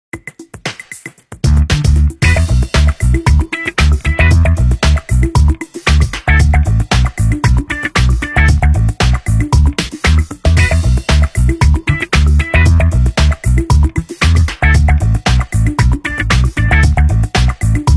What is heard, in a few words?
Heels; Nokia; 5230